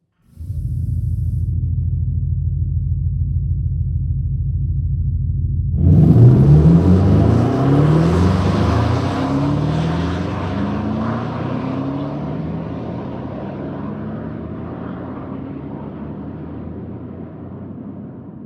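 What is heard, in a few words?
cayenne coupe electric engine sound; porsche; cayenne-coupe-eletric; o porsche de producao mais potente de sempre; conduzimos o porsche de producao mais potente de sempre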